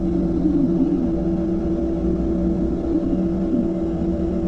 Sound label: ambient space pirate small ship; Stellaris